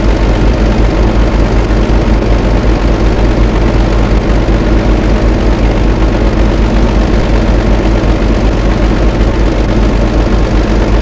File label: Vehicle Engines; Red Orchestra Ostfront 41-45